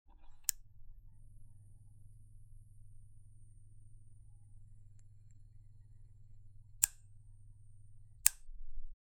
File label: light flicker